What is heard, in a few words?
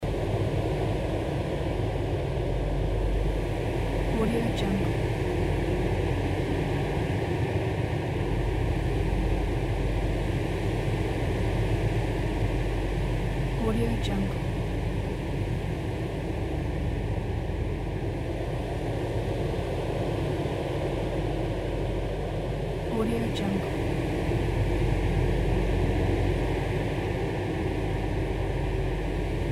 mars wind atmosphere; tibasound